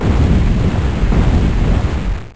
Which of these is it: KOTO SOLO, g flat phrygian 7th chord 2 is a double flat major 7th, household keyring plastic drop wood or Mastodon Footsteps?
Mastodon Footsteps